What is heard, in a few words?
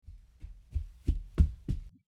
Footsteps running